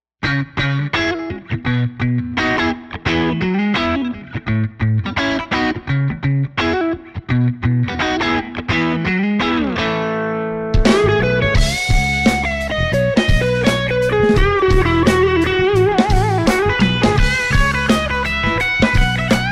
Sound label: TubeDrive